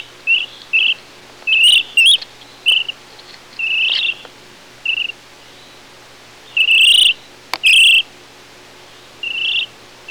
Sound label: purr